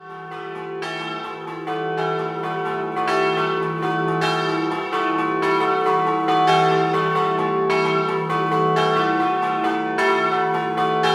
FLAMBRUZZO, campane

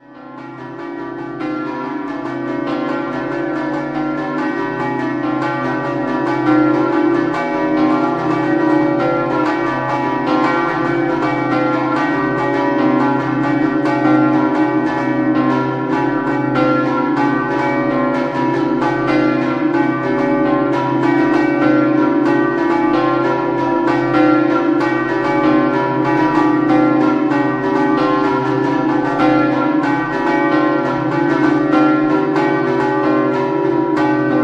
Pieve Di Gorto, campane

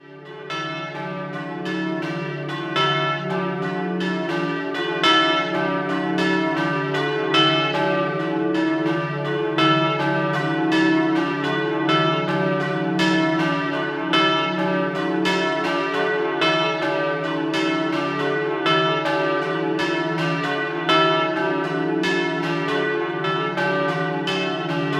Mereto Doppio, campane

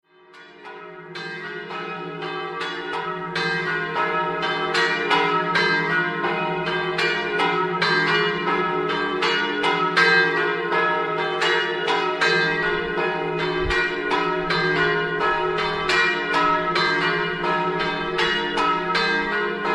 GRIONS DEL TORRE, campane

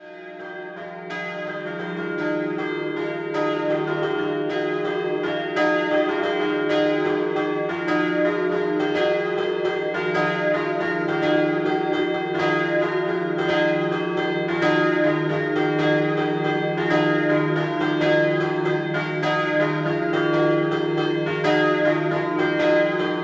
Pozzalis, campane

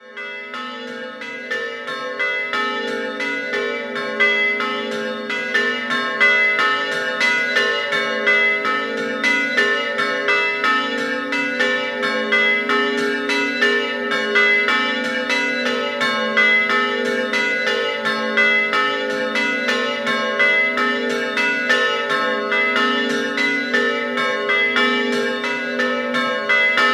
Porcia Sant Antonio, campane

